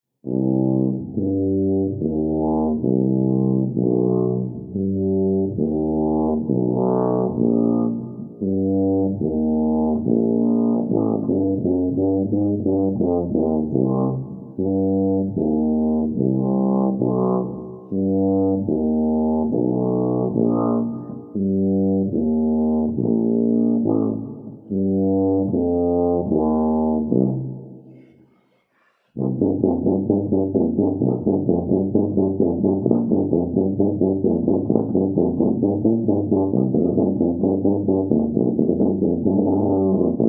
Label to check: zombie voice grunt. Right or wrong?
Wrong